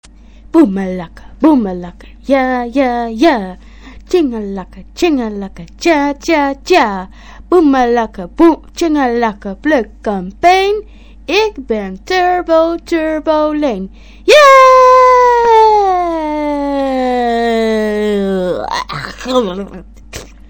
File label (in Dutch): Turbo